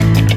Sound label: A3 Bass